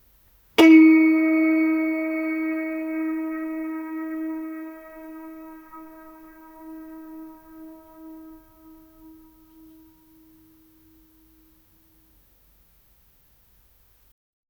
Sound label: play one note on the, traditional instruments